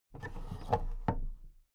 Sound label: big plastic case raise